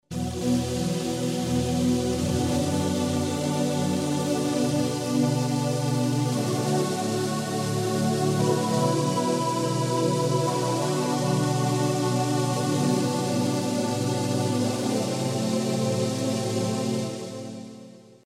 voice pad, Yamaha TG-500; Choir: air

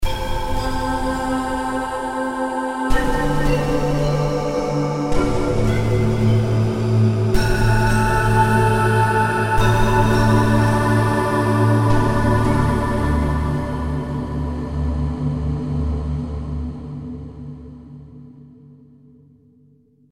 synth, Roland JV2080; Synth: universe 2080

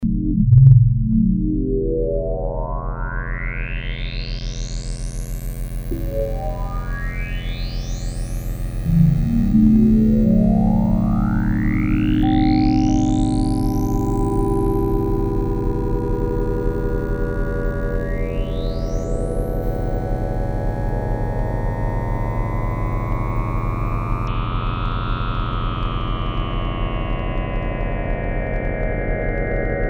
pad time, Roland alpha juno synth

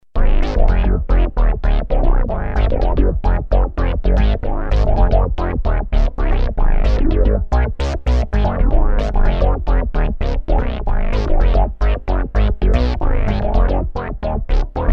bass minimoog, yamaha sy99; Synth: FM

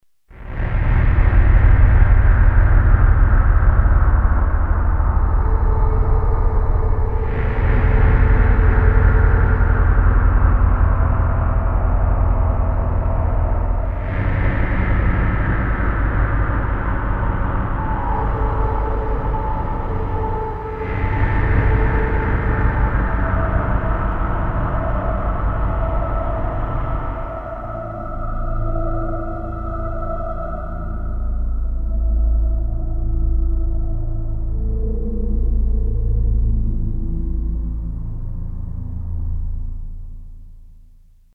ambient fm wind, yamaha sy99 synth; Ambient: OP wind

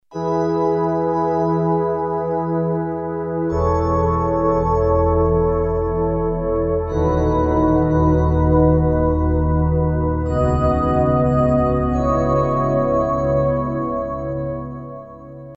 pad church, Pad: church, Roland JV2080

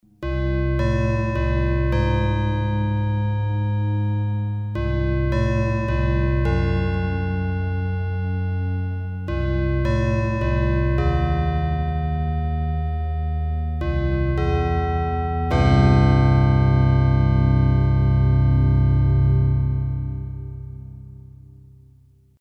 bells, yamaha tg33; Bells intro (FM); ultima; Retrogames music Synth remake

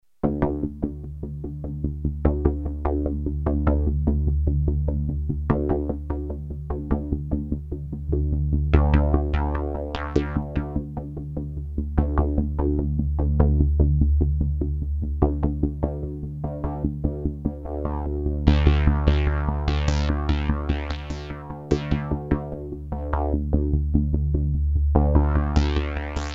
bass; redsound darkstar xp2; Bass quite normal; Main core based on H8/3002 family (16/32bits) + dedicated audio DSP ADSP-2185

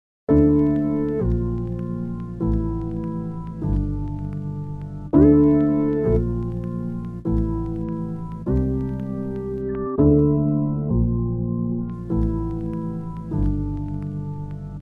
synth; emu mo phatt

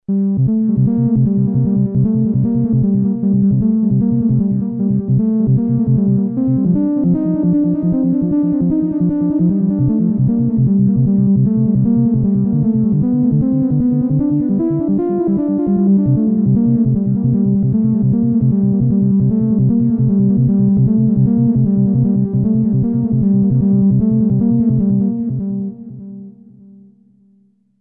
SYNTH delay, Roland JD800

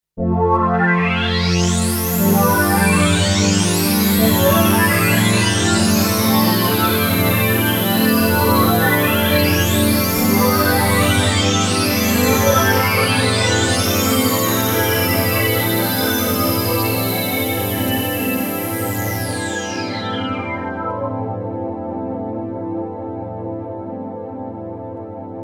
jx sweep; roland jd800; card demo

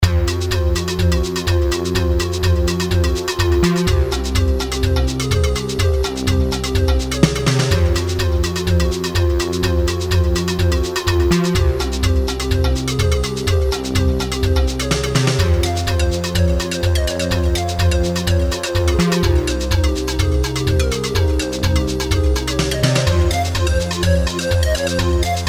korg m1r synth; Full Theme 2; Retrogames music Synth remake

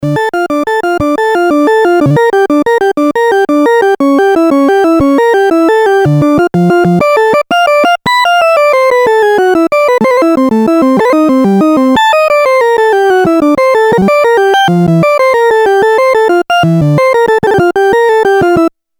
organ, novation bass station 2

